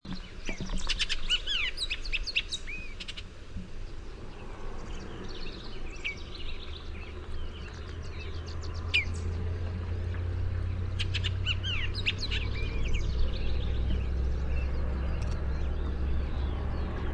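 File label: Bullock's Oriole song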